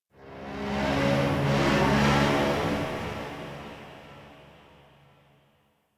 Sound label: Ship Metal Moan Texture Large, Ambience; Warframe; Corpus Ship Remaster